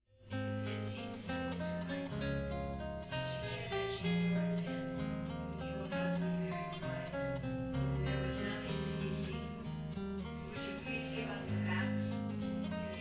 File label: office gitar out